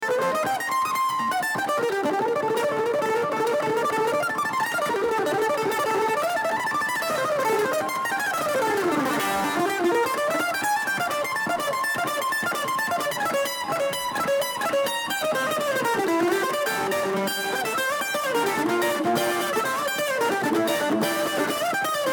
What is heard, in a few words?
Kentucky Mandolin